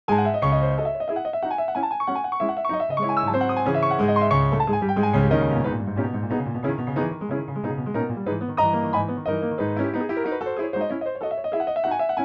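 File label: Chopin Etude 4 Op10 C# minor, Pianoteq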